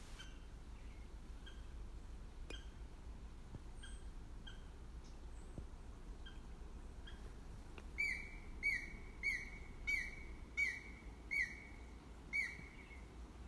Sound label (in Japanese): aogera